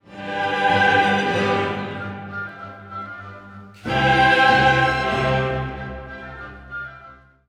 Kyrie loud chortle

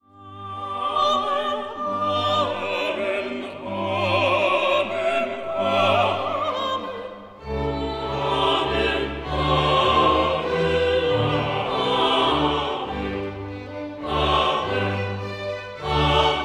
Amen

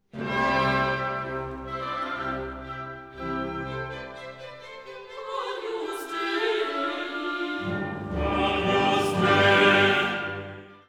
Agnus Dei Chorus Orchestra

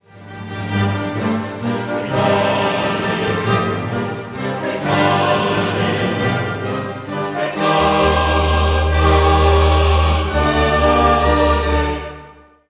Gloria Qui tollis

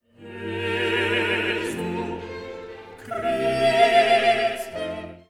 Gloria Quoniam rhythm

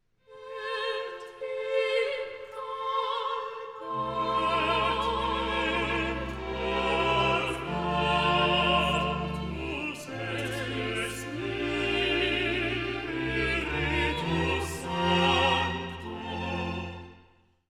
chorus soprano; Et incarnatus est

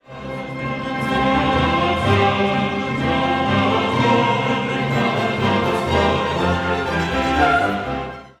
Credo Et unam sanctam

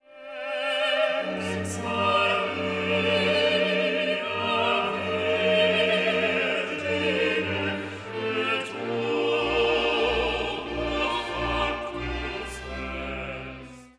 Et incarnatus est

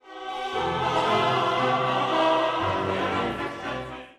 Gloria Turning phrase

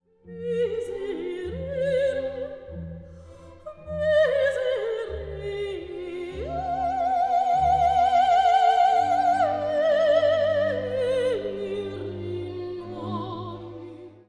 Agnus Dei Miserere

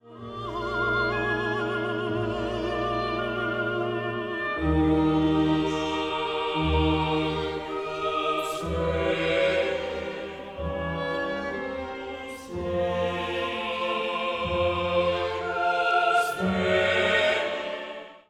Agnus Dei Chorus

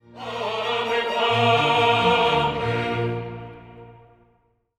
Chorus gets attention; KV275 Gloria

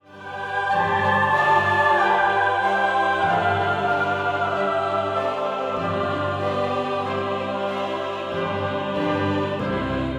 Gloria In cadence

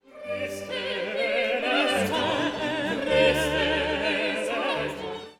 Kyrie Brief solo

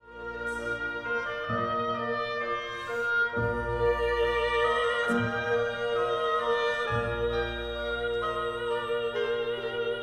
Agnus Dei Laughing

